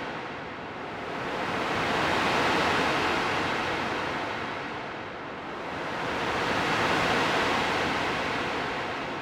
stem texture, music loop; prism

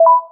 ui confirm; vellum

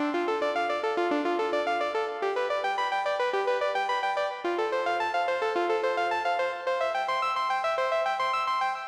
stem lead, music loop; rust

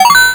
powerup, game sfx; arcade

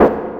step, sfx; rust

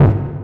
hit, sfx; rust

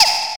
ui click; anvil

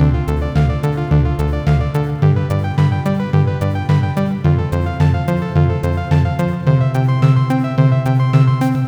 loop, music loop; rust

